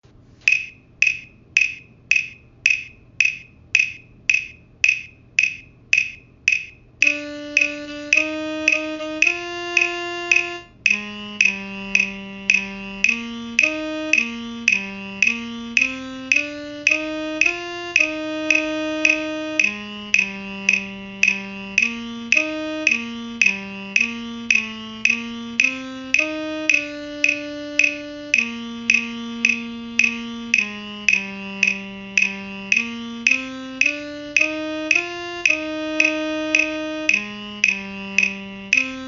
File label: alto sax